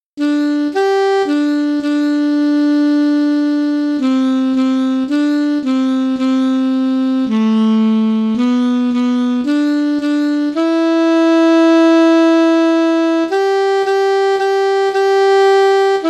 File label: alto sax